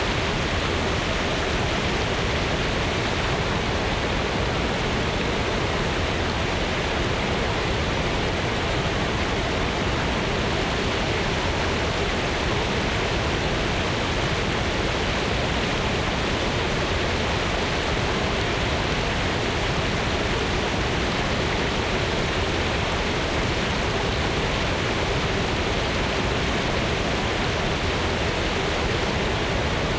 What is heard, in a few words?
Ambience Town Underbridge Intro